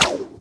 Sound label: attack bow